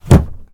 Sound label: Drawers close; Phasmophobia